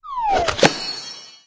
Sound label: Rocket spawn